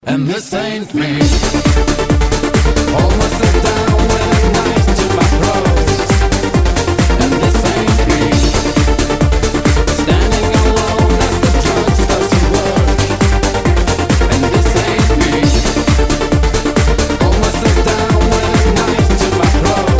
Trending English Fast Song Ringtone

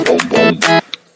sms

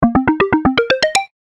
Default Notification; Samsung Galaxy Premier

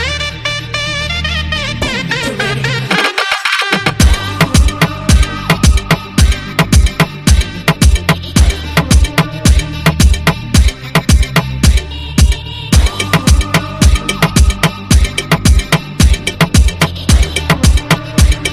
Shenai Ringtones; Bollywood

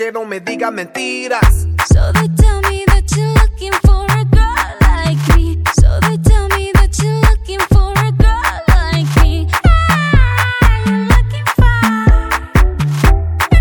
English Ringtone

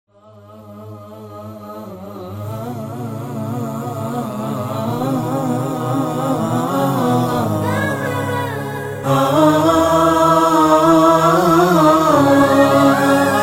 islamic Ringtone

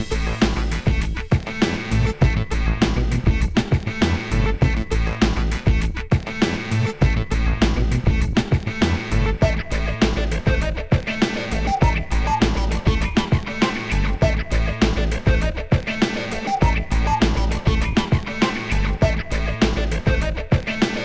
Huawei Mobile Ringtone

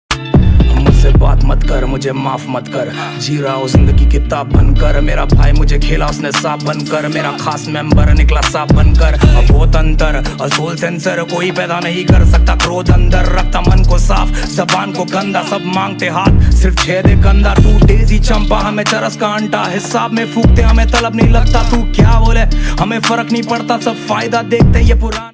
Rap Ringtone

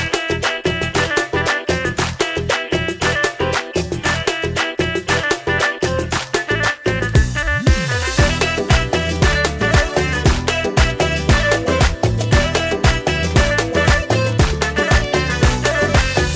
Samsung Galaxy Arabic Instrumental Ringtone

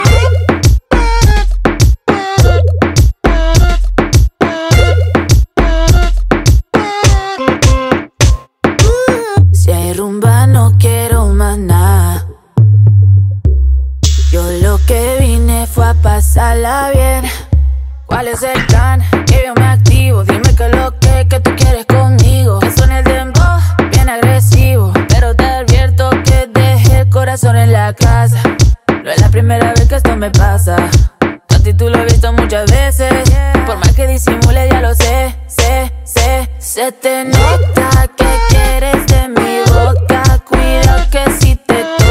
English Ringtone

